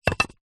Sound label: wood small thin piece set down